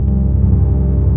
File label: chord